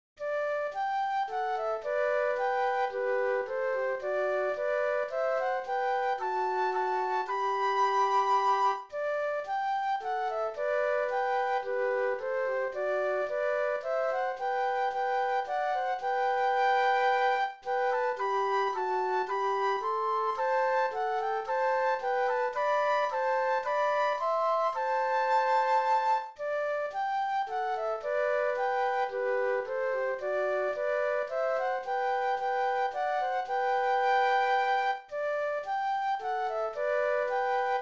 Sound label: Flute Duet